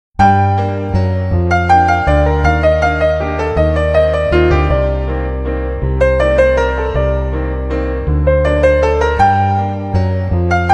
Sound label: Nokia E90 Original Ringtones